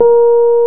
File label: PNO, Rhodes Mk.V; Keyboards of The 60's and 70's